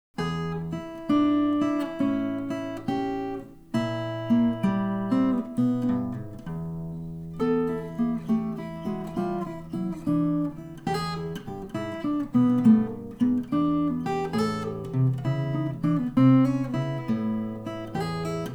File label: still shufflin' blues